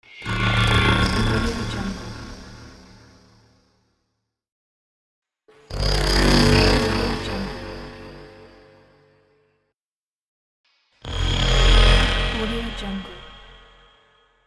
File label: braam horror swish